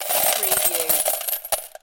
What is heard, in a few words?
Slot Machine Win - Coins Falling Into Payout Slot